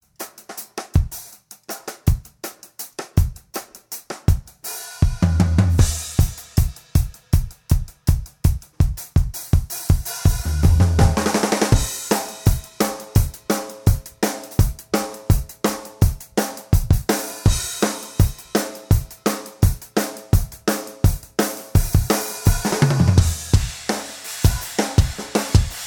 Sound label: Drum Buss; museq